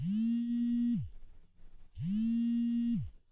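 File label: phone vibration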